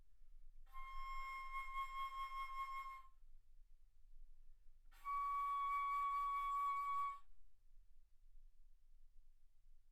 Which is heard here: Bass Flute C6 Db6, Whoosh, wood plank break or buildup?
Bass Flute C6 Db6